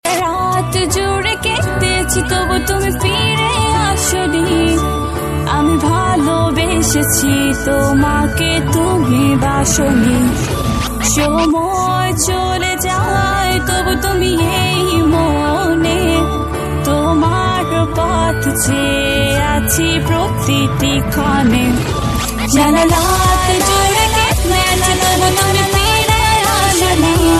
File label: Bangla Ringtone